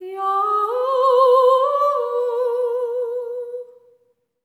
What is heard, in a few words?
SERENE, Symphony of Voices; Spectrasonics